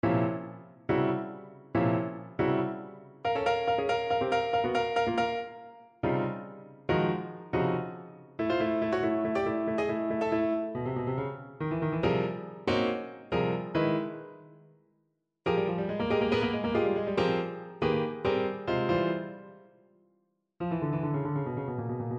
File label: Tuba sonata; Plog mvt 2; sans clic